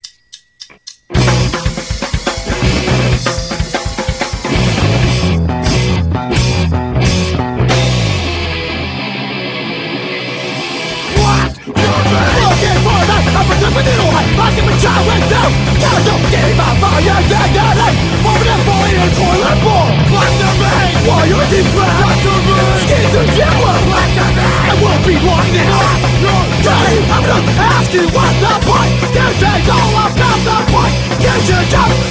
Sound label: Collapse Mid